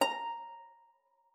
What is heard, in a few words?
harp forte; Xsample VOL-8